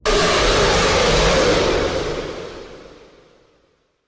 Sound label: jumpscare sound; hourglass oblitus casa